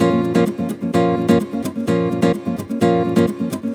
Nylon Guitar - A# min, 128BPM; Vengeance Samples; Special Sounds; Nylon Guitar Kit

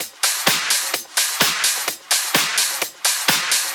House Loop, 128 BPM; Vengeance Samples

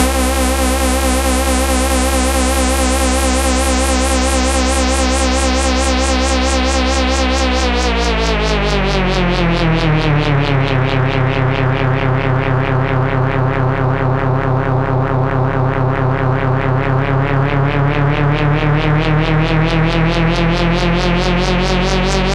128BPM Wobble C, Melody Fills; 24Bars; MibbleWobble; Vengeance Samples

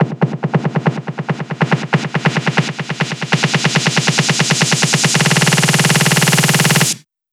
140BPM SNARE 4Bars; Blackout; Vengeance Samples; Snares FX Pairs

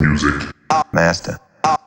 House Loops, Vocal Loops House 128BPM

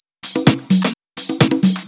Percussive Loops 128BPM; Vengeance Samples

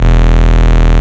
Root E, Bass

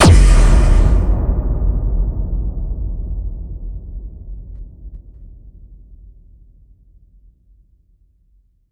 Bassdrums FX, FX Kicks; Vengeance Samples

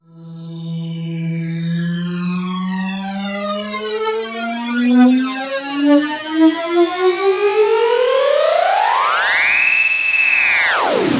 Sweeps Long

